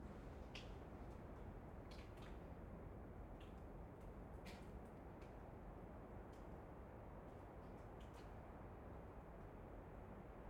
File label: ambient bunker water dripping wind; stereo; ORTF; SoundHolder; Bunkers